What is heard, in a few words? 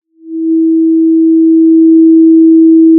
Sound of a cruise ship horn